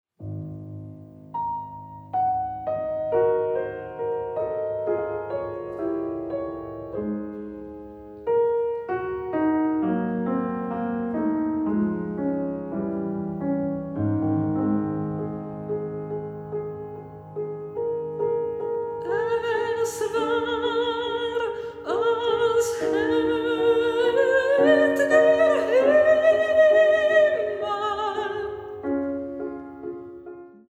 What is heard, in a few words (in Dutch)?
zang en piano; Robert Schumann